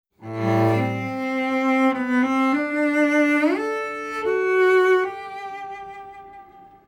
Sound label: Cello